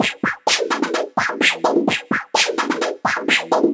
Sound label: VFX2 Loop 128 BPM